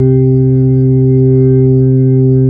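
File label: B-3 Club crossfade, Keys of the 60s and 70s; Roland LCDP10 Keys of the 60s and 70s 2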